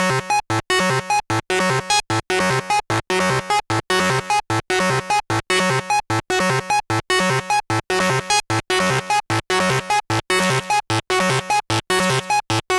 Screamin Leader, acid; 100 acid vol 3

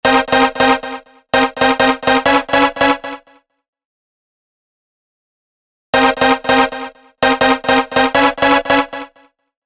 Classic Stab, rave; rave generator bundle

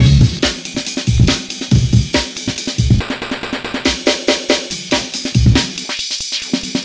Amen Breaker 140Bpm; vst fx bundle

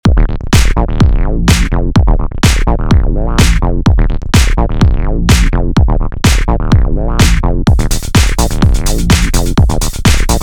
bass pumbers, acid; 100 acid vol 2

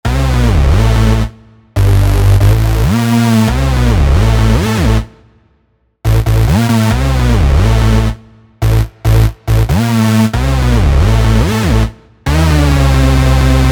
Hoover; rave generator bundle; Juno; Hard Basstard

